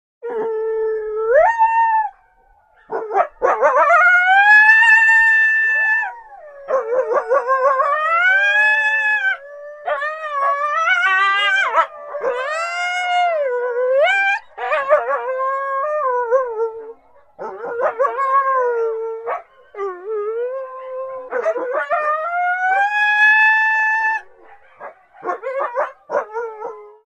Coyote Howls. Several Close Perspective Howls Of Single Coyote With Pack Howls And Barks In Background.